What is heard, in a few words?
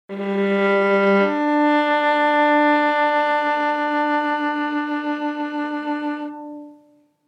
legato conventional, An orchestral setup; A simple yet effective method for more natural legato sampling